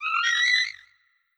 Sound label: Hawk; Legend of Keepers (gamerip) (2019)